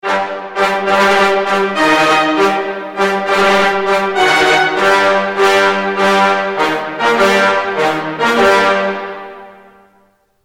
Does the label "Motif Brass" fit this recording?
Yes